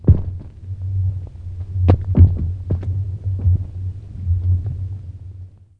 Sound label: Cracking; ImpGal2; colony